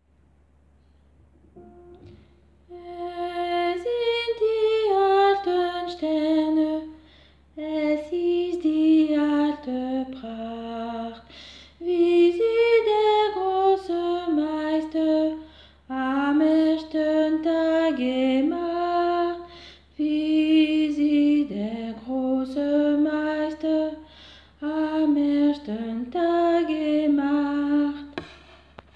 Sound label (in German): Es sind die alten Sterne alt